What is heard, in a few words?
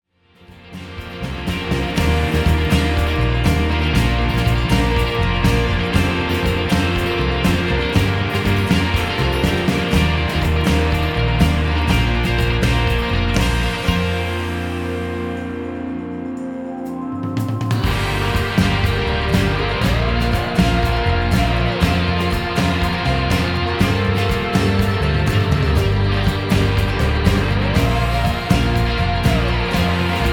Instrumental Version